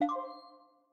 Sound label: Enter Name Player; MiSide